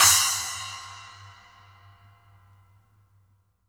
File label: SPLASH B, SPLASH; Sampleheads; New York City Drumworks VOL-1